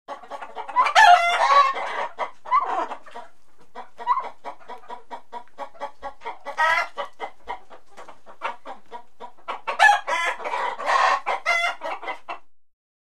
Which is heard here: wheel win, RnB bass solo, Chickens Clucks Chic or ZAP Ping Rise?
Chickens Clucks Chic